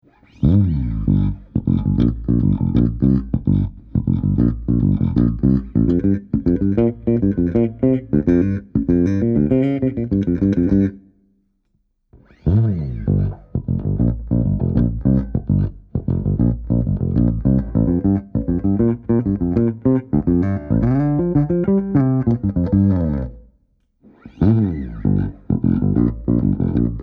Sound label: SAMPLE BASS ONLY; g l l2k cherry burst w k wiring